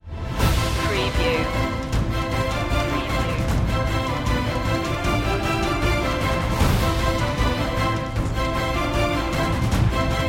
no choir

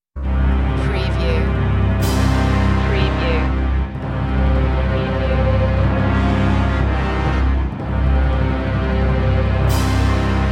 no choir

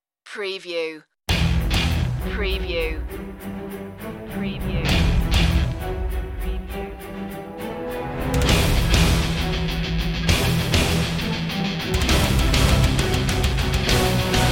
clavis aurea rock mix

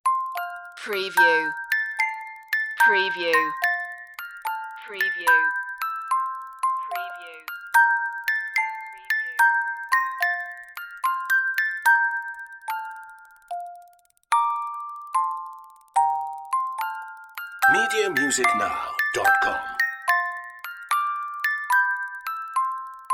musicbox; i saw three ships

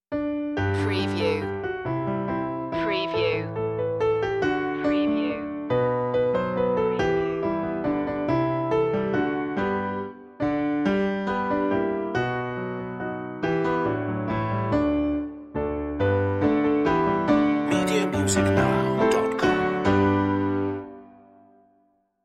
wish you a merry christmas piano 140

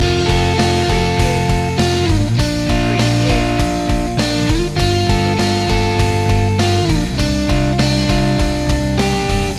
indie go loop

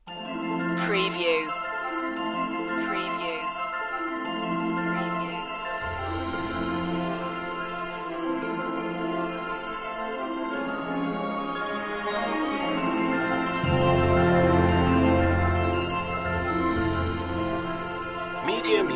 no choir

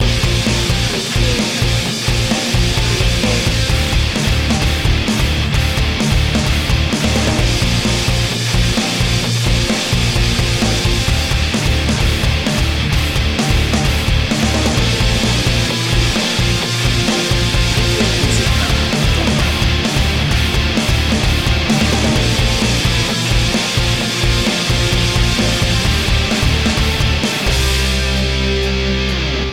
what happened loop

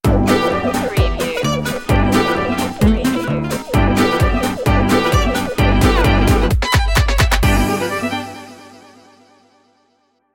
doo wop bop stinger

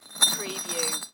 Brick Scrape Drag; sound-effects-bible

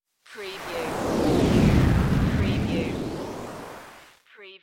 Scifi Production Element - Sweep Over

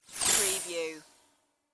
Laser High Pitch Whoosh

